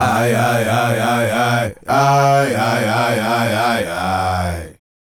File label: Male Group, Hip-Hop and RnB Vocals